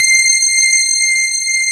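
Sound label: grand piano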